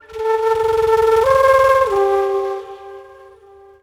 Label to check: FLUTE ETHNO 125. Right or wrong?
Right